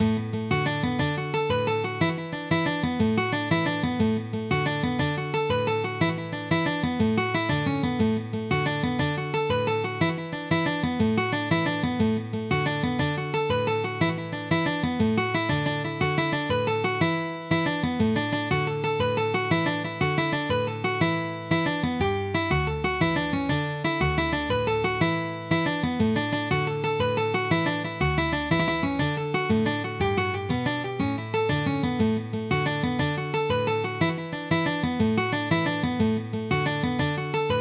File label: Hickey's Jig